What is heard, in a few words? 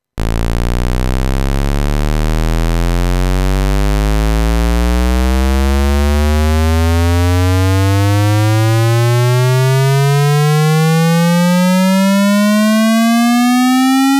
CH1 sweep, pokey